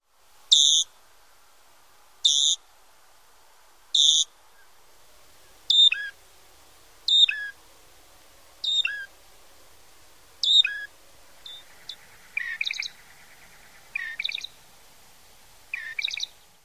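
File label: song, bird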